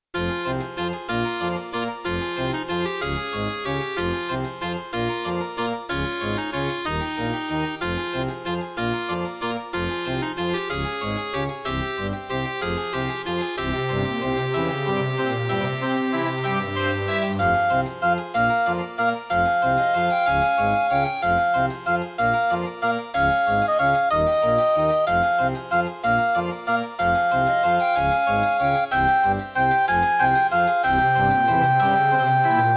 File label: Track 6, Yamaha MU90; Death Gate